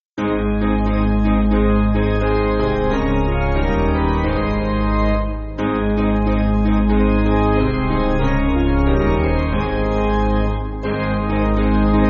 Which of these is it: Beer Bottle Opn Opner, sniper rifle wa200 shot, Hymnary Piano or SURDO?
Hymnary Piano